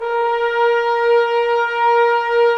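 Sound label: brass trombones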